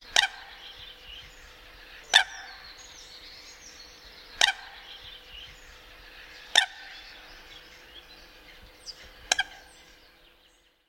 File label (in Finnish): nokikana